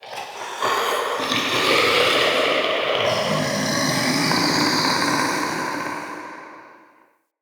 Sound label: Male Vocal Idle, Enemy; Mockery; Mockeries